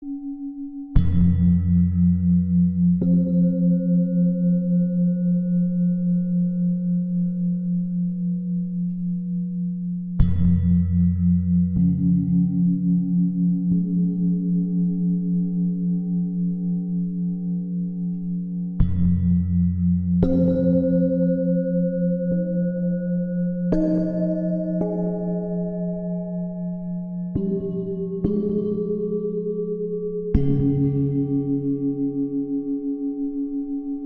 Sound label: Solfeggio